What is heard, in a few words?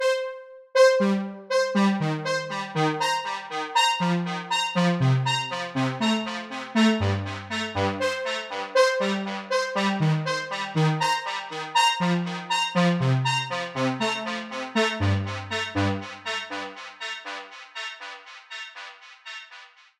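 Syn Brs Stab, Ping Pong, synth brass stab; feedback echo plugin; Preset: Ping Pong Queen; Newfangled Audio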